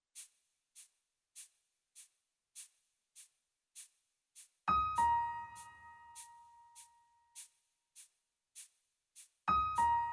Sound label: Arpe